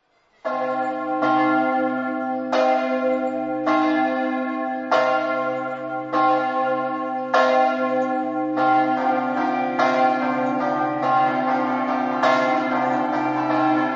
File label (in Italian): scampa, campane